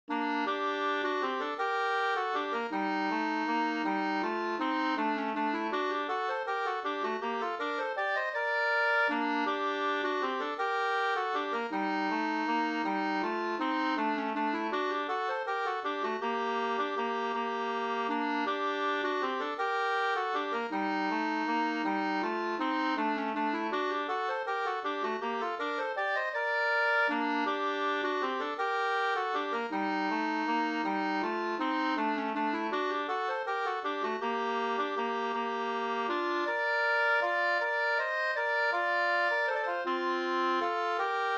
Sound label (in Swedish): vals